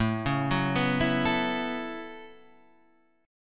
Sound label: A 6th Add 9th A down Guitar Half Step Down